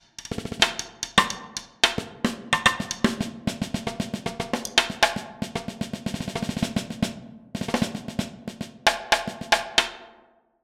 Sunhouse Sensory Percussion Real Snare

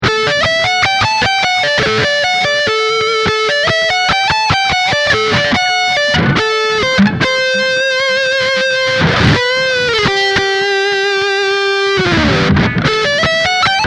Lead Neck Pickup

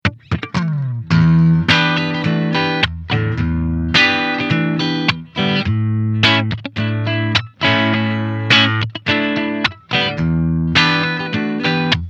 Clean

